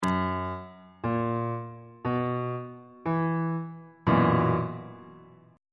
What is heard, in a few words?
b major 7th suspended 4th 2nd inversion on bass clef